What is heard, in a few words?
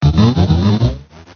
talk